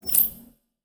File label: hover